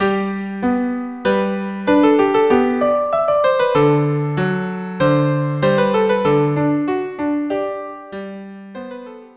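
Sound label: viola duo andante; KV 487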